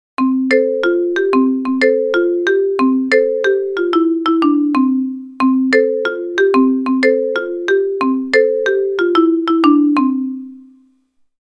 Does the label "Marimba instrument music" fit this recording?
Yes